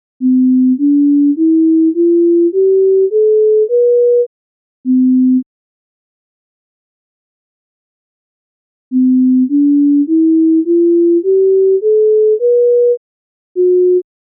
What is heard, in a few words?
Diatonic Probe Tone Studies